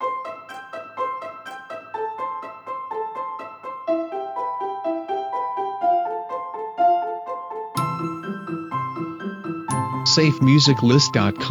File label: Uplifting Holiday Jingle